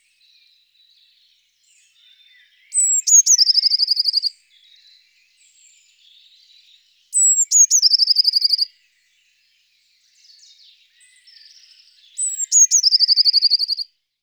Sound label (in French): mesange bleue; TOULON; PRESQUILE